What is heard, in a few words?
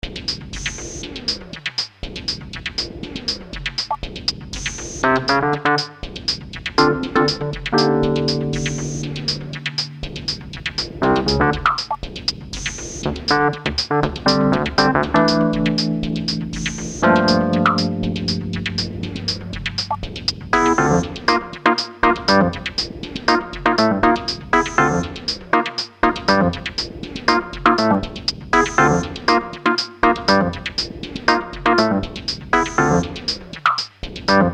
Cyberfunk Wurlitzer; lounge lizard